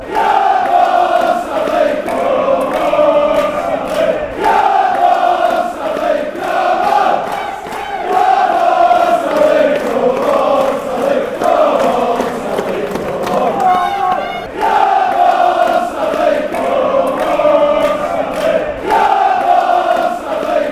come on, chants; stanley; Accrington Stanley

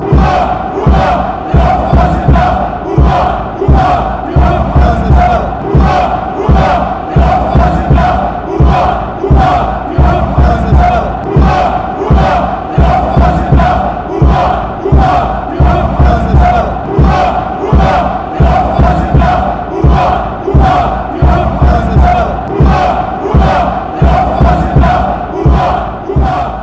horray fan chants

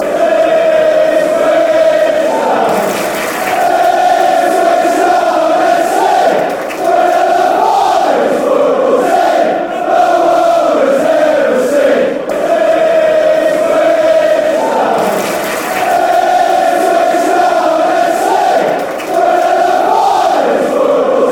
fan chants, football chants; ipswich town fc

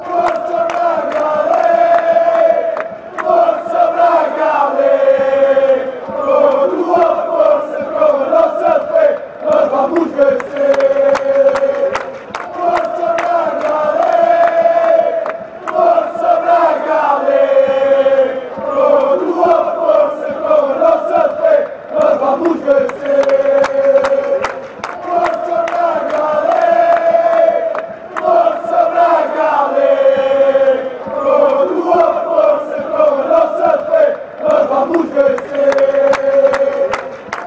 well win, fan chants

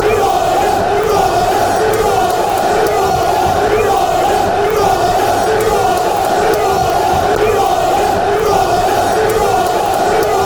who are ya, fan chants; brfc

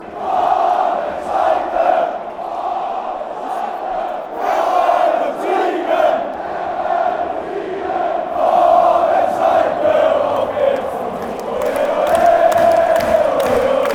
come, chants; schalke

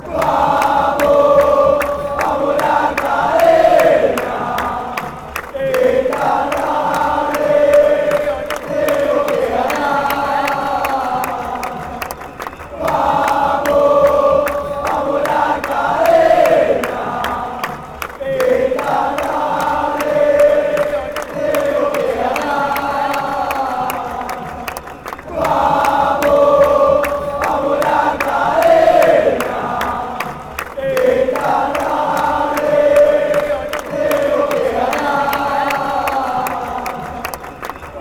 afternoon we have win, fan chants

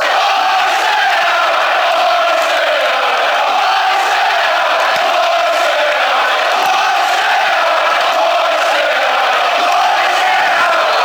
oyston out fanchants

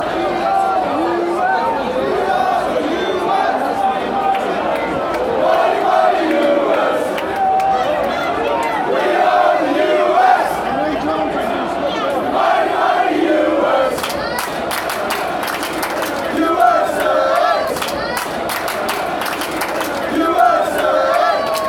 fan chants

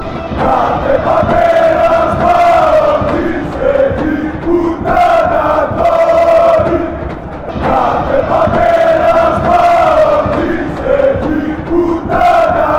chant, fanchants; olympiacos

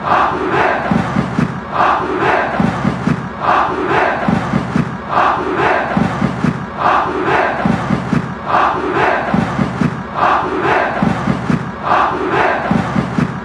fan chants; aktobe

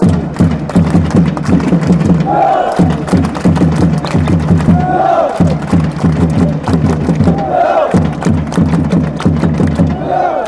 drums, chants

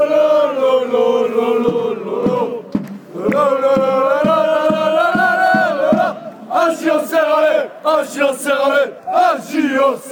lololo allez, fan chants; aj auxerre

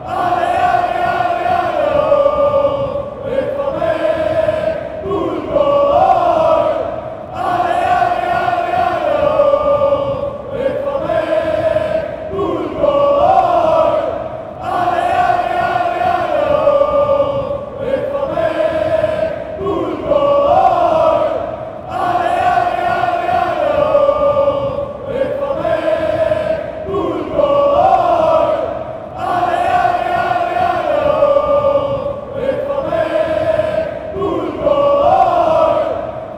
allez allez oh, fan chants; bvb

